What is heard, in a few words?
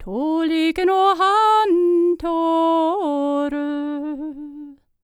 Nord, World Voices; ILIO; Vocal Planet VOL-5